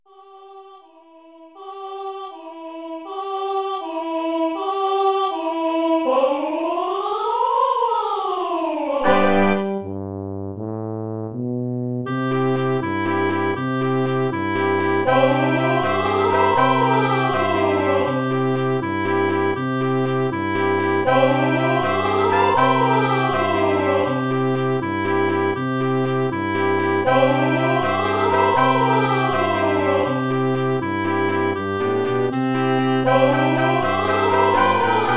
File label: accomp